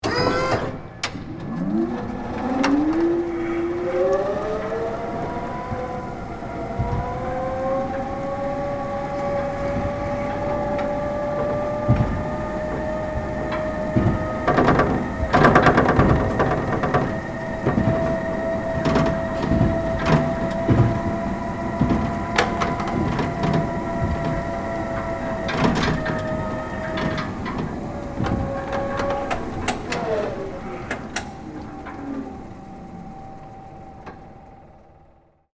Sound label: Hi Lo Operate, Industry, Hi, Lo, Operate, On, Board, Perspective; Machinery